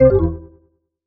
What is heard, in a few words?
NFC Failure; effects; khadas edge2